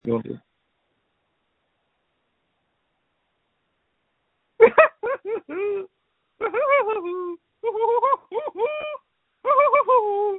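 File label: screams that feature laughter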